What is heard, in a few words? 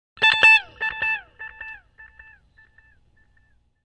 Delay